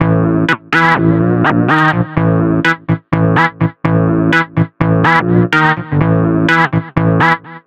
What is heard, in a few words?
Electro House, Synths; Sonic Foundry (Sony Creative Software); Underground UK House & Electro